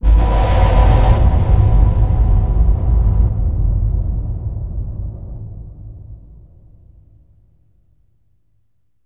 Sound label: Impact; Hits